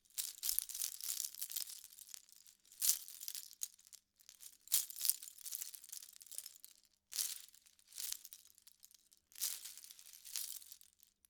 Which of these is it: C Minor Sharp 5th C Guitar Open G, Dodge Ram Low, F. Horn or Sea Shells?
Sea Shells